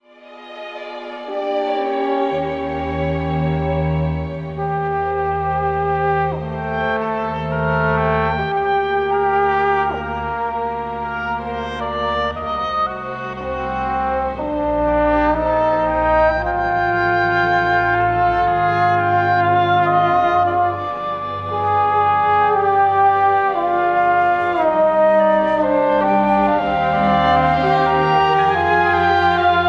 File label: jazz orchestra